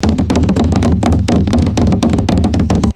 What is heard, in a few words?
rapid wooden percussion cartoon scramble